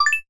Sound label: sparkle rising